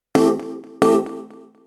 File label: fields, 140bpm keyboard; hous-tec